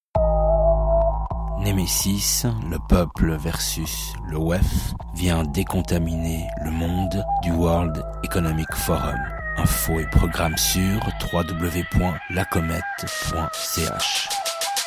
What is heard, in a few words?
Jingle; Nemesis